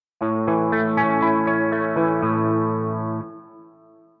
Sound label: arp